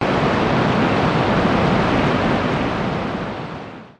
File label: White Noise